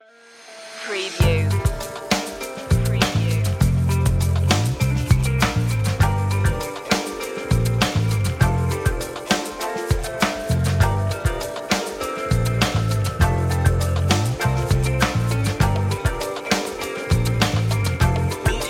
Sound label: funky chill story